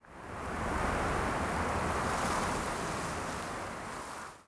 wind TREE; The Elder Scrolls III - Morrowind; Morrowind GOTY GOG

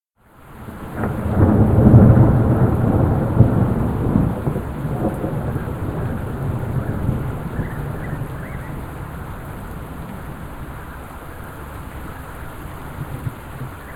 Thunder; SyrinScape

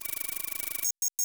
inspect diagram on; Papers Please

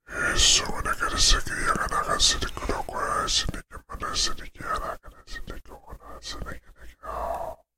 Shadow apparitions - deep whispers; SyrinScape SoundsScape Collection; bbh Haunting part 1; TTRPG

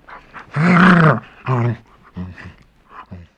wolf pissed; The Elder Scrolls III - Morrowind; were

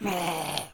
Goblin Injury; SyrinScape

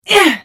Hit; The Elder Scrolls III - Morrowind

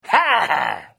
Hit

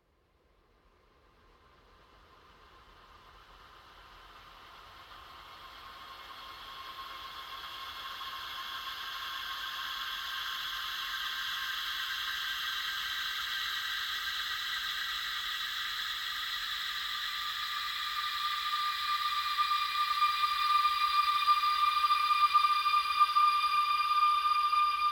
Ghost; SyrinScape; Clockwork entities

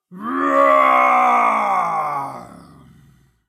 Dino roars, Jungleplanet dinolaser battle; SyrinScape; Syrinscape unplugged

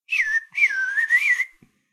Whistler bird

